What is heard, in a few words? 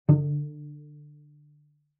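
Cello pizz fortissimo sul C D2; MIS Pitches - 2014; Strings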